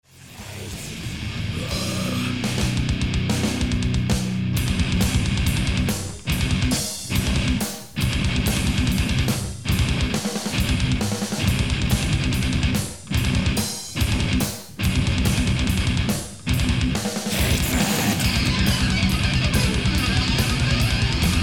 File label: solo